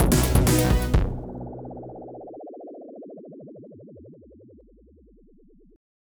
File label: Level Complete Fanfare; Atomica Deluxe; windows